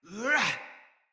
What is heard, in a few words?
Attack Heavy, Battle Barks; Envoy